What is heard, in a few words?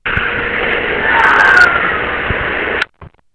AGONY